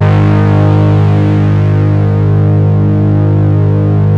FIFTH BUZZ G2, SYNTHS; OBMX-SYNTHS

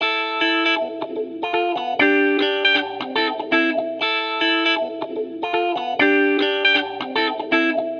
120 Cm Scratchy Funk, Spaced Out Guitars; Loops; Touch Loops; CosmicFunk

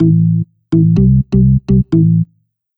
DEEPHOUSE BASS 125 A

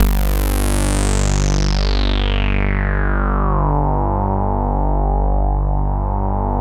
BIG SWEEP G2, synths; SE-1

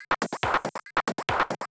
TECHNO BEATS 140

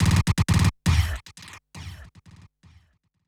SPACE FX 140; SpaceSounds

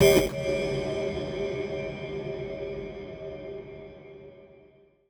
TECHNO FX 140

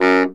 B SAX STC F#1, Baritone Sax Stacc; Orchestral; VarazdinOrchestral

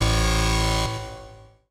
KEY SHOT TEKNO 140 A; Techno2; Singleshots

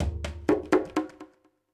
PERCUSSN ETHNO 125, Drum

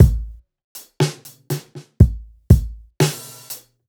Rocker, Loops

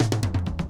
TOM FILL 124, drum; BREAKS

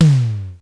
SIMM LO, drum, tom

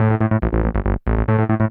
SNTH BASS ELCTR 140 A, Techno

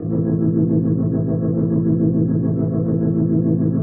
DEEPHOUSE ORGAN 125 A

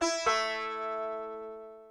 ethno, 125, A

